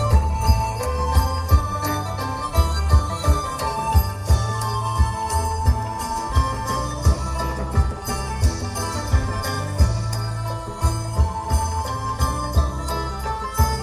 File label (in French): Thaïlande Bangkok musique